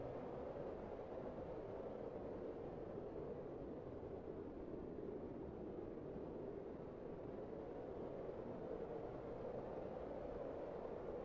penguin wind blow; CodenamePenguin

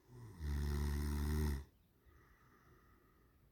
penguin bear sleep; CodenamePenguin

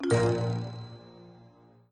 penguin win sound reduced; CodenamePenguin

